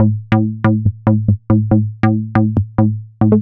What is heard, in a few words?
bassline, dance